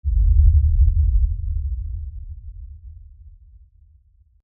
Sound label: Tremor LFE Rumble, Scifi, Tremor, Lfe, Rumble, Science, Fiction; BlastWave